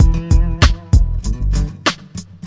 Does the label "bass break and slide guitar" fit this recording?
Yes